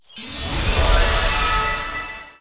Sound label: random Symbol Transform; fairyhansel